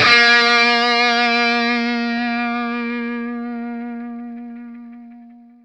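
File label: SINGLE B flat 3, Vintage Blues Guitar ELEC.GTR; USB Soundscan vol.22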